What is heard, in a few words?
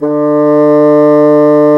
D3, Bassoons; Roland LCDP04 Orchestral Winds; 90 s Sample CDs